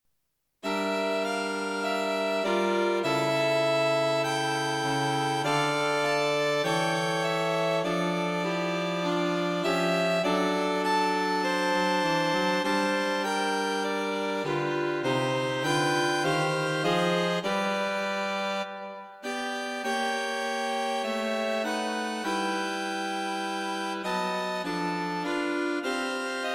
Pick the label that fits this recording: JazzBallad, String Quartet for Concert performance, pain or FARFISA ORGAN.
String Quartet for Concert performance